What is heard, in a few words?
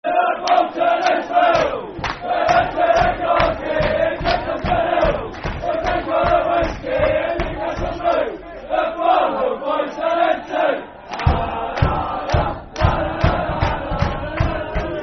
Football Chant, Soccer Song; We Went Down To London; Partick Thistle Ptfc